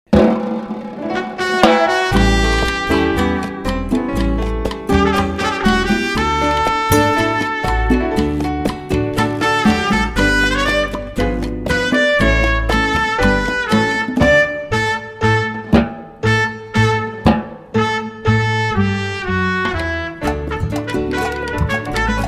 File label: Cuban Cha Cah